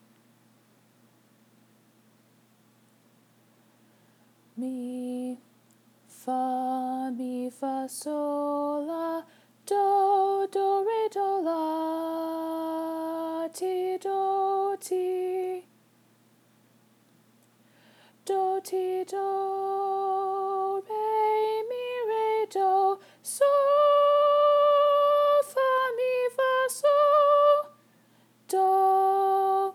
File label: alto